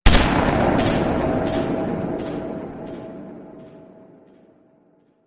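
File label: weapon shot with reflection effect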